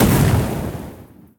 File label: Rocketland; Likho; Folk Hero